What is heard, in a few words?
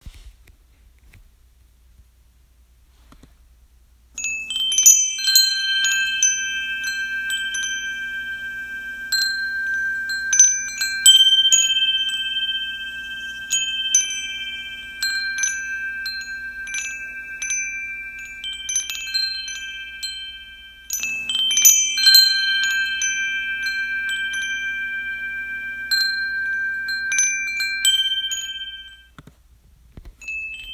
jade chimes